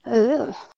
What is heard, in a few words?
ooo